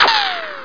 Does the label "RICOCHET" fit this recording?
Yes